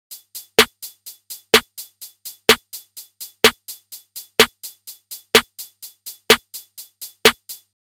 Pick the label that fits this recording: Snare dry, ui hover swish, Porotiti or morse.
Snare dry